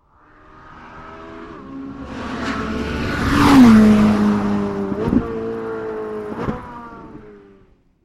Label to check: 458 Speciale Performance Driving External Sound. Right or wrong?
Right